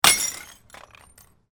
junk

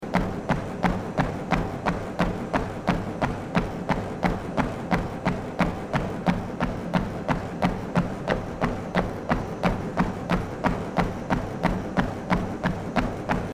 gym treadmill running 15kph loop

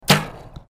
archery competition bow firing

